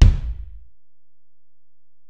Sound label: Ac BD, Alesis DM-PRO; 300 Drum Machines